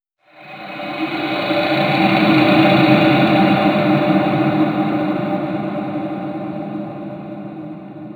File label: environment graveyard stinger ethereal